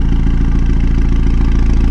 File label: EXHAUST IDLE